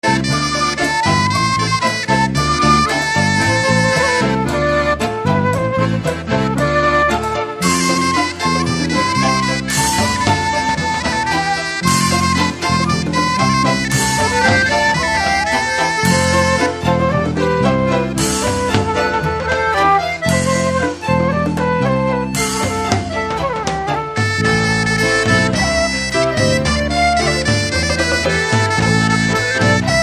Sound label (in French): gavotte du bas leon